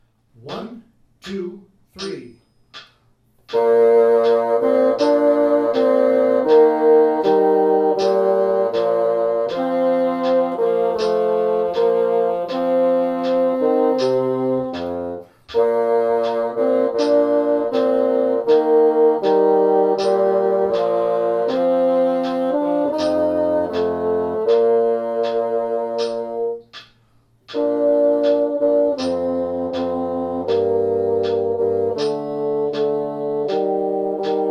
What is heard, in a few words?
Bassoon 1 and 3; Parts 1 and 3; "Alma Mater"; Rubank Elementary